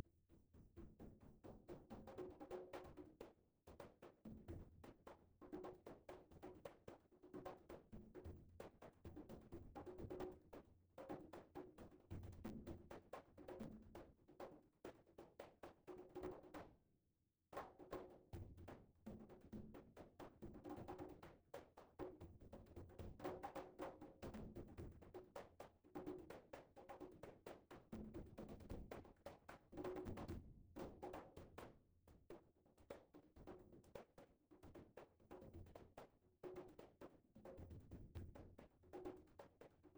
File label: CONGAS Residual Noise